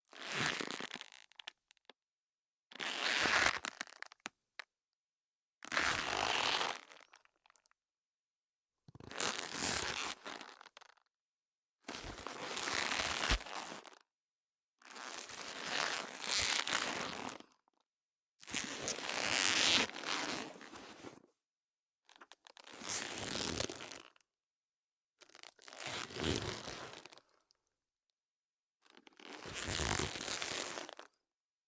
Clothes Leather Jacket Twist Short Creak ShotgunMic; Guild Wars 2 (Original Foley Soundtrack)